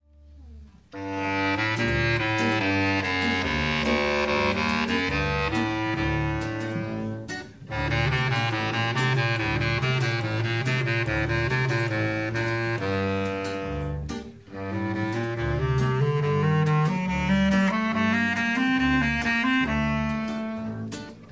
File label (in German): bassklari